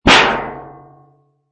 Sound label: Impact Metal; Sheet metal impact.